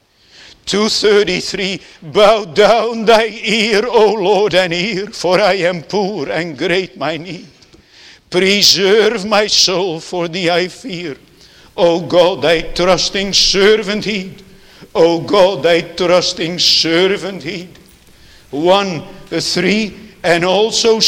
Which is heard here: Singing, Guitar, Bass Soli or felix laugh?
Singing